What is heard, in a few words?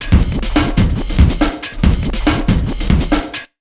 RUN 140, Big Beat Drumloops